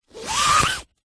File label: fabric nylon cloth movement in hand scratch; zapsplat